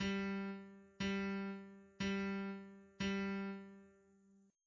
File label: bass only